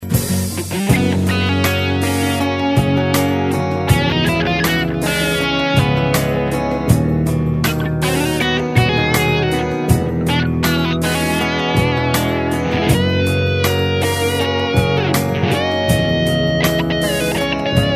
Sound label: guitar solo